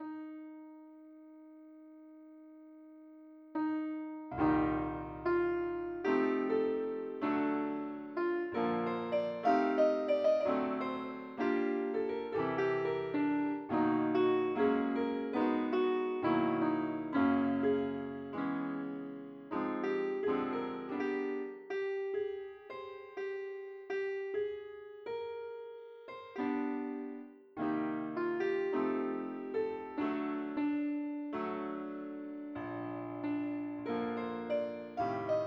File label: piano